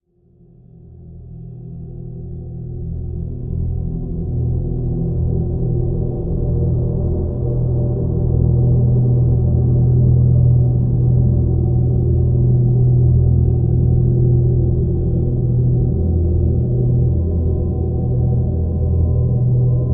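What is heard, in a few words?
drone E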